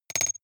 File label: noise metal hits splutter; maschinen; signale; soundpool